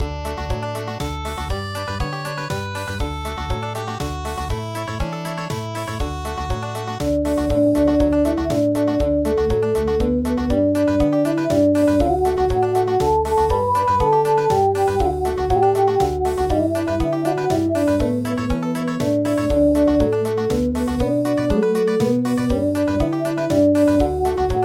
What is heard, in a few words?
Country